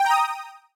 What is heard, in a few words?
button click; Wacky Races - Sling Races; NextlevelGames